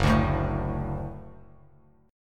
Gb Minor Sharp 5th, Bass Standard; strummed; JGuitar

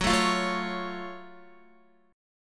Gb Minor Major 7th Gb down Guitar; strummed; JGuitar

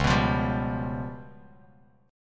Db 7th Flat 5th down Bass Standard; Db7b5 strummed; JGuitar

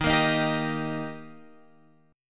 D sharp Major D sharp Guitar Open F, chord; strummed; JGuitar

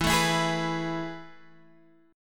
A Major 7th Suspended 2nd

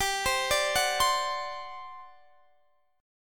G Major 7th Suspended 4th, G

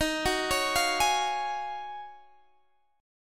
Eb Minor 11th Eb down Guitar Drop D; Ebm11 arpeggiated

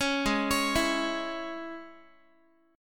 G sharp Suspended 4th Sharp 5th G sharp, Guitar; arpeggiated

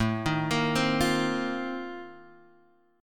Bb Major 7th Suspended 2nd Suspended 4th

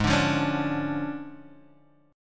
D Minor Major 7th Flat 5th, G sharp

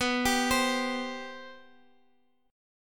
C 5th B; C5/B arpeggiated

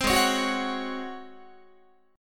Ab 11th Ab Guitar; Ab11 strummed

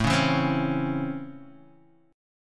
C sharp Minor Major 7th Sharp 5th, chord

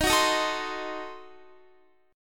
D sharp Diminished 7th D sharp Guitar; strummed

